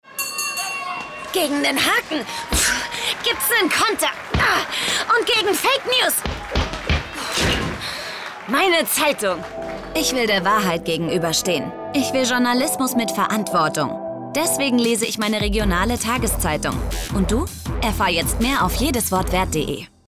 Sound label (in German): Boxen Funk mit Jingle